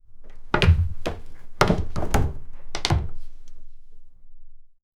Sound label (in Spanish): fuerte aterrizaje de pies